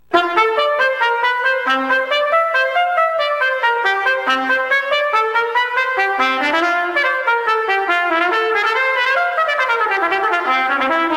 trompette

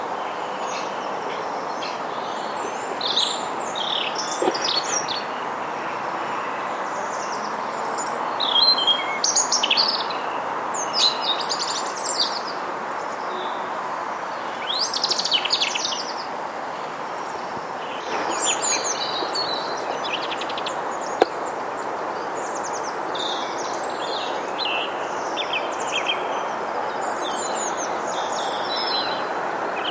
rougegorge griv musicienne

